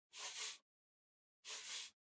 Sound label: PC Mouse Move Far Soft Pad Short